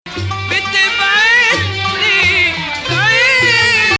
Bayati Octave

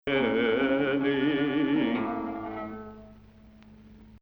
Hijaz

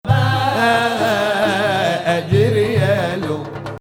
Ajam; Zanjaran

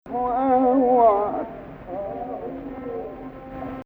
Rast Fein Ya Gameel 2nd Rast

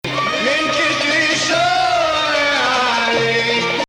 Hijaz

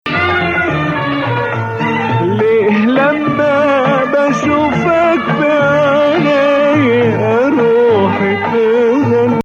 Nawa Hijaz; Maqam Nawa Athar; Hijaz 5

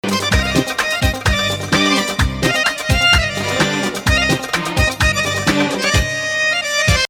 Nahawand

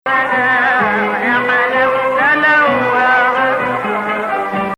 Hijaz

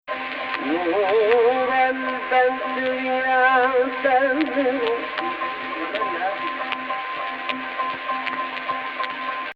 AHH, Egypt Rast; Jins Rast

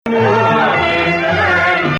Nahawand

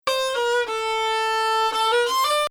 Bayati Samai Husseini Rast; Samai Bayati il-3ariyan; Husseini 5 (Rast)

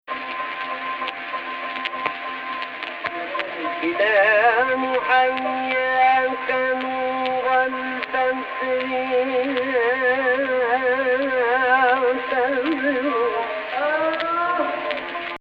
AHH, Egypt Rast

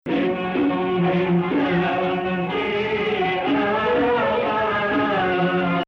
Rast Sihtu Nahawand

